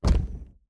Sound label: reel stop